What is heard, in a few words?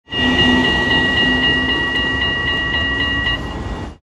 Tram alarm, Rotterdam Field Recordings